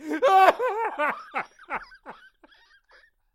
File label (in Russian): muzhchine ochen smeshno